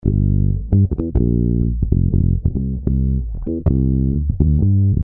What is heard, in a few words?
bass modif; celemony melodyne